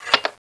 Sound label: rpg7 reload; weapons; cstrike